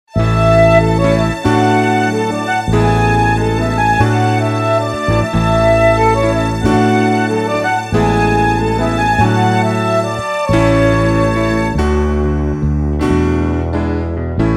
Pista instrumental sin melodia

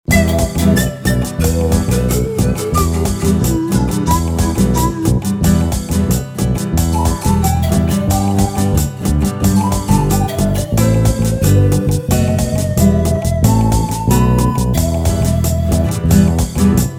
Pista instrumental sin melodia

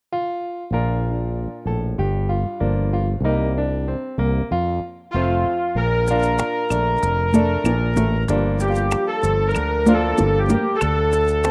Kyrie Pista con melodia instrumental

